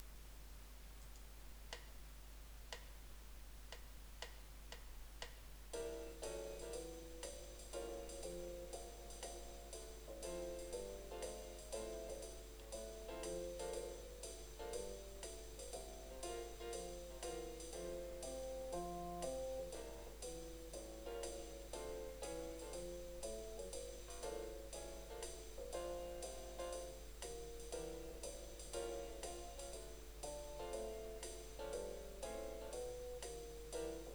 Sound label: accompagnement, violon